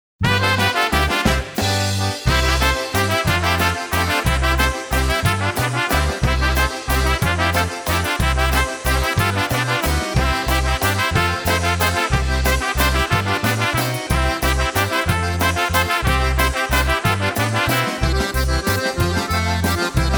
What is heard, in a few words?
Left Hand Oberek, polka